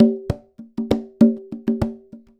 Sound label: Conga Loop (100BPM); musicradar